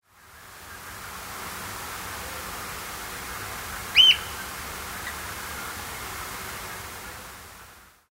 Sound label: oystercatcher, nocmig